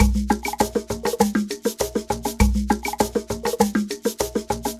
Ensemble, Latin Percussion

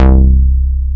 SH101; OLD ROLNDS

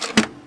Foley Mid, Drum Bits, Sweeteners

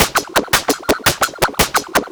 Percussive, Drums & Percussion, Beat Components

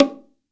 BONGO

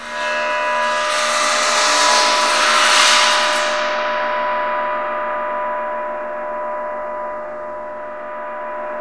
WATERPHONE; WATERFN

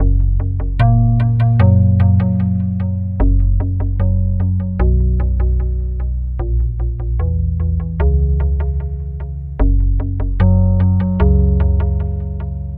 Pluck Bass Echo, Synthetic Pulses

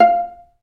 Vla Piz F4; Digital Sound Factory Virtuoso

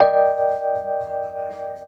HEY, Roots Disco; USB Soundscan vol.32; AKAI

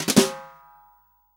SD, REAL DRUMS, SWING KIT; Best Service ProSamples vol.43; Real Drum Kits

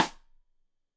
Side Stick Stereo; Deeply Sampled Drums

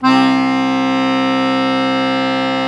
Accordians Musete; Club-50 - Foundations Roland

